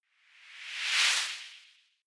whoosh simple flutter phase high

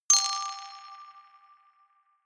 notification glockenspiel rattle fifth

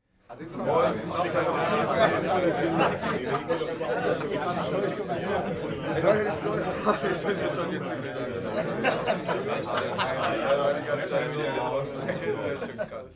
male crowd happy short

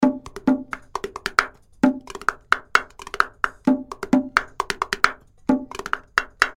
oriental percussion

